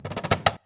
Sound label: CAJ FILL, cajon fill; Univers Sons - Raricussions